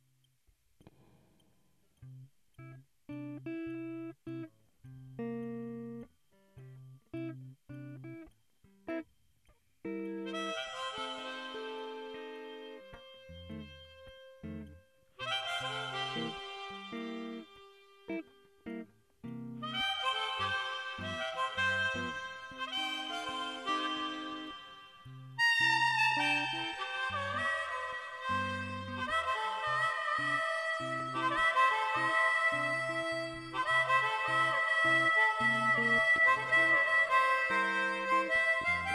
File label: Chinese Strat Hohner Pro Harp; Ilya Tyaglo